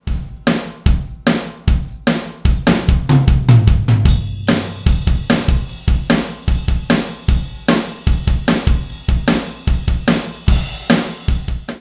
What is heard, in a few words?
drums; Bateria